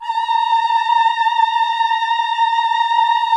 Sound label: VIB F A#5B-R, Symphony of Voices; Spectrasonics